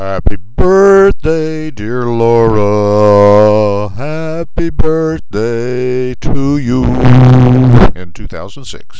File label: birthday singing